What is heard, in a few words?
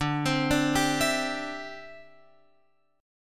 D 7th Suspended 2nd Suspended 4th, Guitar Open F; D7sus2sus4; arpeggiated; x,9,12,9,10,11